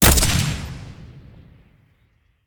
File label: atk rifle, player